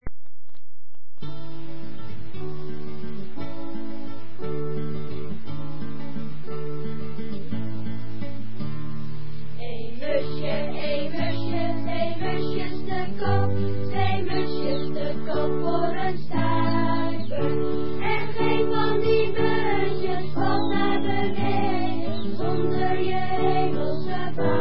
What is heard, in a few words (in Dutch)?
liedje koor